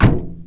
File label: door shut